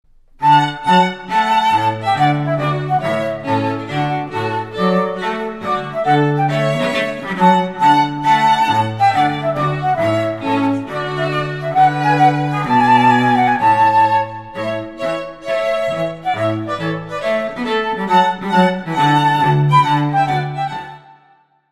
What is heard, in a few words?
Trumpet Tune